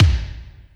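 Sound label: kick Big O, Alesis D4 drum machine; 300 Drum Machines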